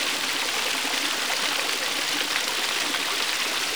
water fountain; Golden Crayon Sounds

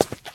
concrete step; Golden Crayon Sounds

